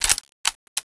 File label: reload